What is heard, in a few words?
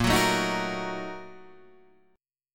A sharp Major 7th Suspended 4th Sharp 5th; A#M7sus4#5 Chord {6 9 7 8 7 6} strummed